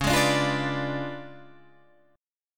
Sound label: Db 7th Flat 9th Db down Guitar Standard; Db7b9 strummed; JGuitar